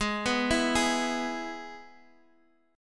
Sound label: E Major G sharp